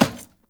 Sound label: Heavy Grunt Boot Walk Metal, footsteps; Characters; Enemy; Sinecure